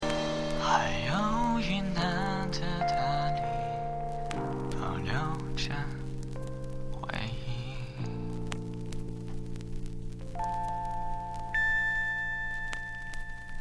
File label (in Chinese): 杂音音源